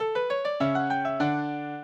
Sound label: minuet, mozart